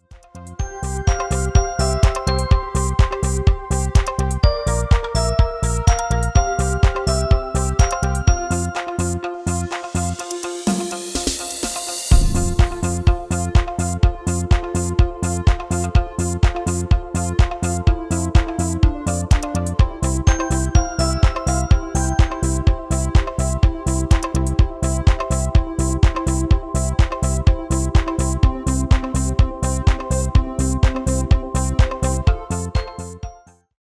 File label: MIDI